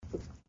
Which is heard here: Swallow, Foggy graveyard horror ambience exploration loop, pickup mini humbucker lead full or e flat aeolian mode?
Swallow